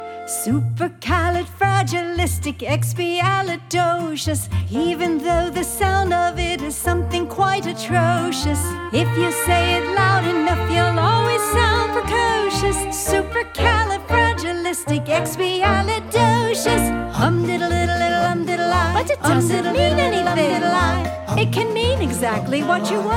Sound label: backing vocals